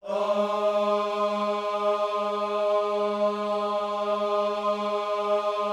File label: OHS G#3, GOSPEL; Spectrasonics; Vocal Planet